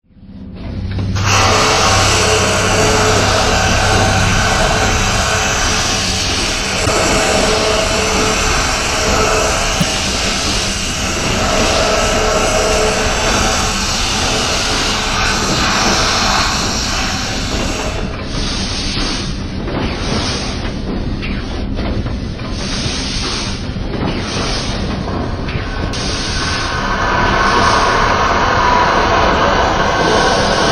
Machinery, Vent Room; the white chamber original soundtrack 2006

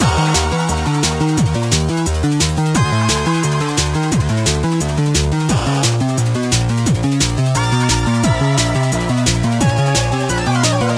Demon Music; laser kitty pow pow switch gamerip 2019

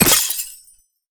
ice spell impact shatter; Infernal Radiation

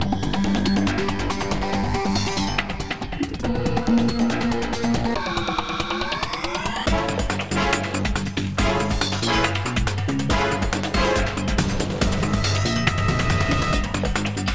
Combat Loop

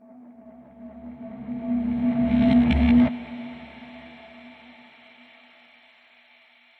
Noise Sting; dead to rights retribution

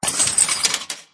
Click Trading

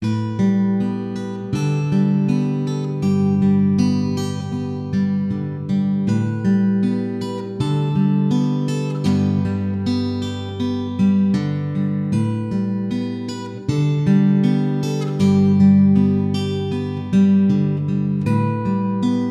Acoustic Guitar; fragile dreams farewell ruins of the moon

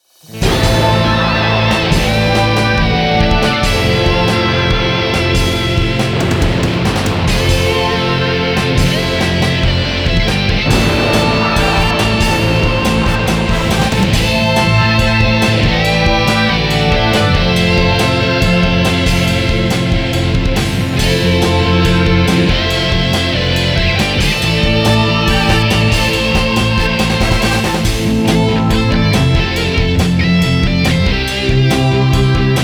VICTORY EXPLOSION; PS2; Fire Pro Wrestling Returns

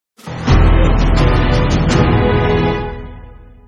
Music Lose; Brave Fighter 2 Monster Legion; Android

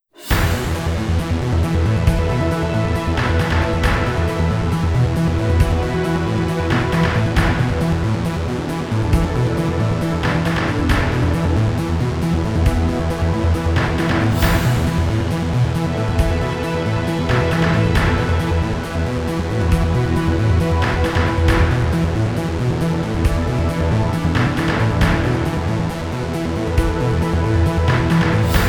Arcade Menu; Ace Combat 5 The Unsung War

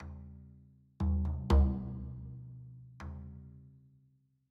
Drums; Citadel Music Drums; Frozen Flame; windows

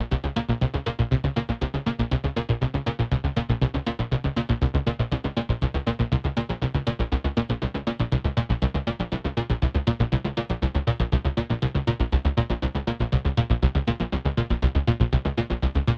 Synth Blade Loop; headlander

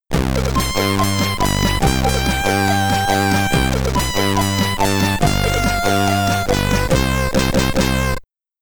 SID Stereo, commodore 64; Italy O sole mio

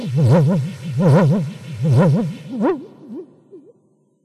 Trip Strange Birds; And Yet It Moves

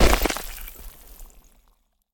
Shatter Med; Boomerang Fu

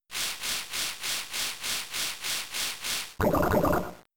Smog; Pokemon; Gen 3; attack moves; RSE; FR LG

